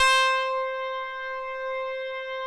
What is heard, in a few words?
MW PULS